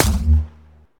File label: hit, pong